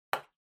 Drawing Box Ball Put

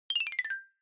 Xylophone Slow Short 4 Down

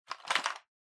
Pencil Case (Shake)

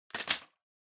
Vacuum Cleaner Pipe Attach; 掃除機03-33(パイプ 付ける)

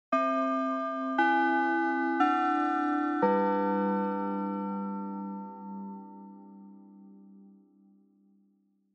Japanese School Bell Slow Short

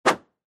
Motion Swish

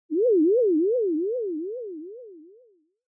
Fantasize Short High; シーン・妄想; 短 高

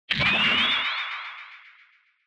Retro Anime Accent Long

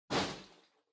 Loose Fill Packaging, Far Hand In Strong; 梱包材

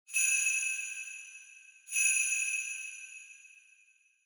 Sleigh Bells Reverb Twist Gentle Short

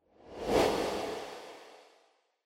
Camera Motion Mid Effect; カメラモーション; 中 エフェクト; シーン・カメラモーション

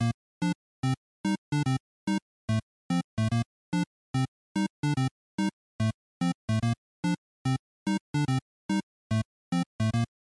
NES Action Stage Loop145

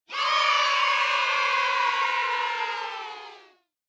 Cheer "Yay" High Long Solo

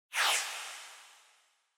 Motion Agility Low Reverb; モーション 俊敏; 低 リバーブ

